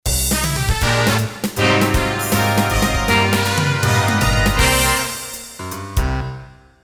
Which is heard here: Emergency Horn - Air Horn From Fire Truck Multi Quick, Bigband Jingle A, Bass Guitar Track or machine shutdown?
Bigband Jingle A